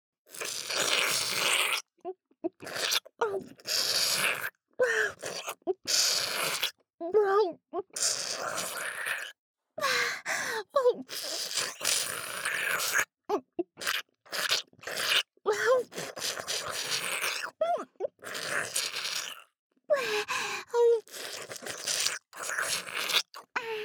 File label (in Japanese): バキュームフェラチオ【ループ】; ループトラック